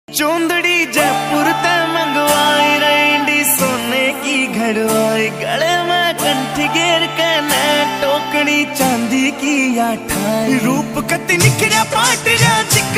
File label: hindi ringtone